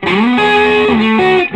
SOLO E, Vintage Blues Guitar

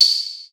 techno, PERC; Techno Trance Essentials

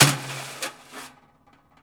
Prepared Drums

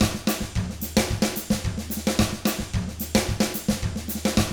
110 SAMBA, Drums; ILIO; Double Platinum Drums 1

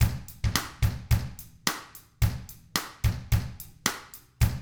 POP, Drums; ILIO; Double Platinum Drums 1

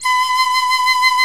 PICL; Roland - LA Composer vol. 1; WOODWINDS

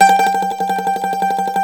MANDOLIN; StTremolMandolin

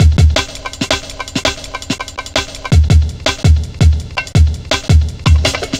Drum Loops, 165.5, Jungle

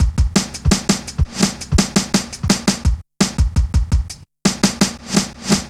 Step Ez, Drum Loops, Jungle, 168.5

